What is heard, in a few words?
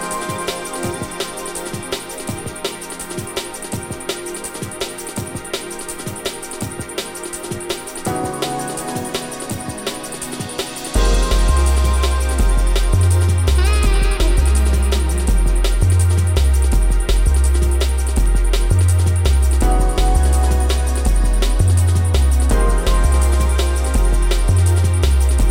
sax lick motions